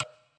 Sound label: glitch tile; gonner linux macos ps4 switch windows xbox one gamerip 2016